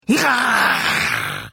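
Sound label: Attack, Khajiit female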